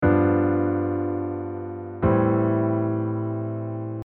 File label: chord cadence